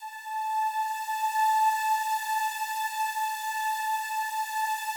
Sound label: slow strings A4, Yamaha VP-1; 90 s Sample CDs; Music Store